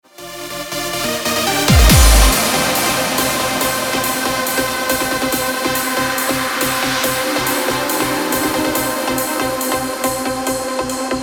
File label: Trance